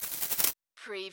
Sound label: CARTOON FAST SHAKE